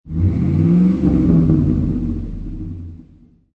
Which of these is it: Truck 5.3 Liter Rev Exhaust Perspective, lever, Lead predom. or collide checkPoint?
Truck 5.3 Liter Rev Exhaust Perspective